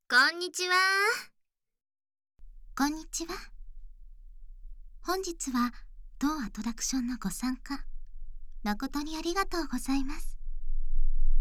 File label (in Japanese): 効果音あり （キス・耳舐め・ささやき・乳首責め）